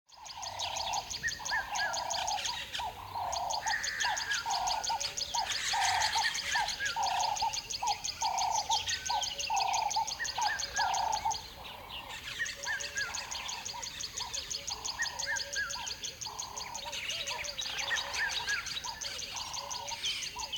AMBIENCE Savanna Medium Dawn River Bed Birds Bedlam, Nature South Africa; SmartSoundFX